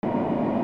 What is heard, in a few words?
HOUSE